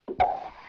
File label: pop